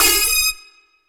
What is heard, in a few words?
1 Shot Siren A#4; Digital Sound Factory Xtreme Lead